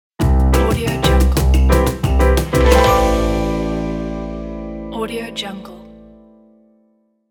latin logo

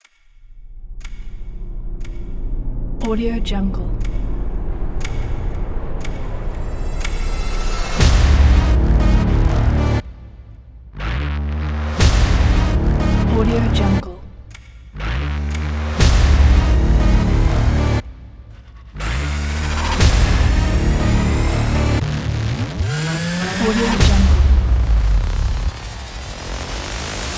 action hybrid trailer dramatic

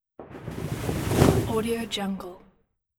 parachute

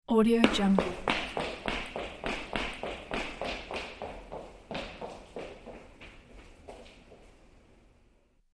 run footsteps on cement

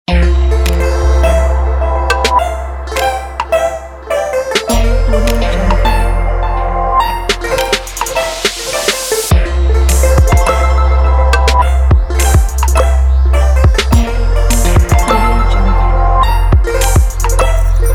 modern electronic logo pack

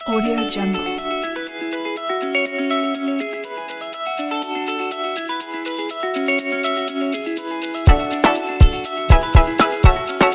summer tropical pop

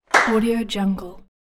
hand clap

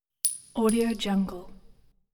shell casing drops high pitch

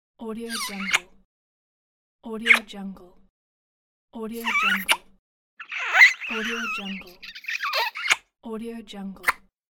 kiss; wavbrulee

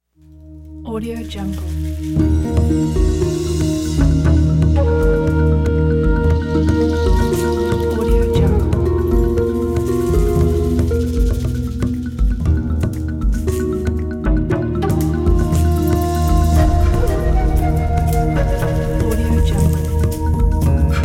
cloud forest

